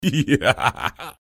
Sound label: laughter; мультяшный смешной смех различных персонажей